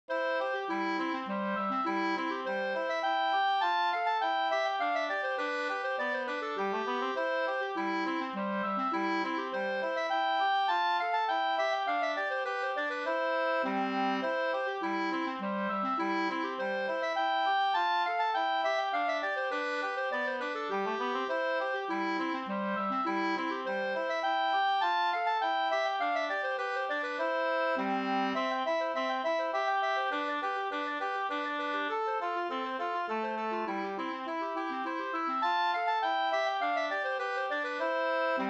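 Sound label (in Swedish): slangpolska, two-part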